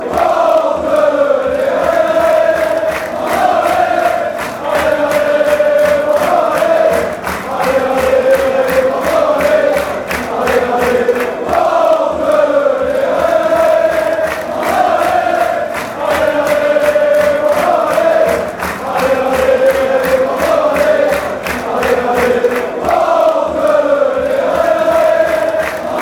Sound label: allez allez, fan chants